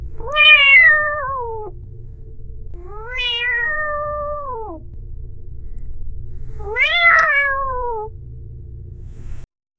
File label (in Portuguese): som de gato miando; pet